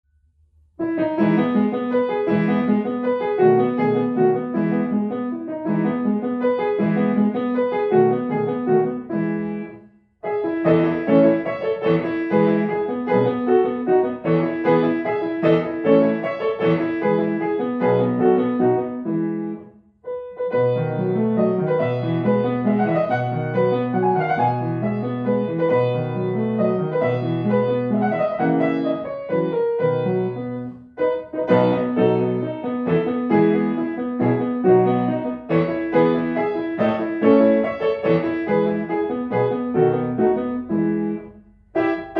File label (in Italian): per pianoforte piano; Clara or The Last Waltz